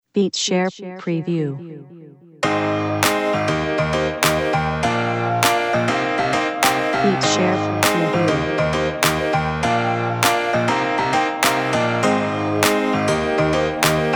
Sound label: Pop hiphop sample bpm100